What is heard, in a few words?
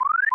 alarm; abbottabad compound